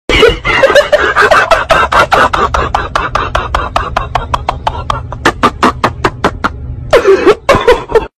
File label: hahahahahaaha